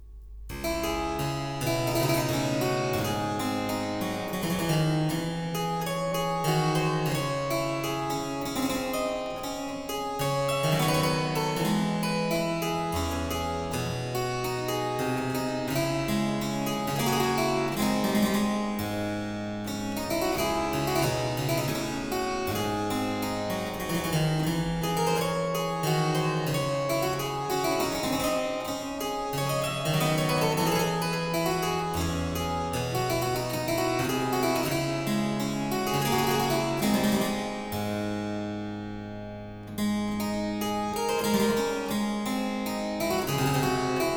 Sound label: Suite in D Minor III. Sarabande, Harpsichord; ed. E. Roger, 1710